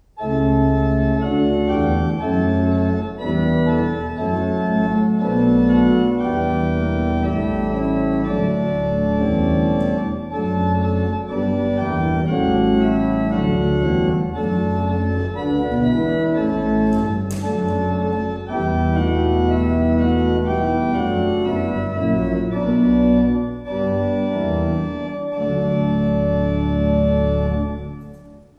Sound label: Simon